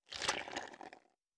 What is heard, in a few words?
big fly stomache problems